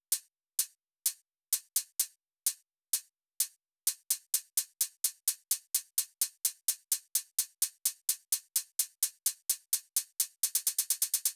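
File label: Drum Loop 128BPM hi-hat Add; VEC5 Drumloops; VEC5 Multi Loops 128BPM; Loop Elements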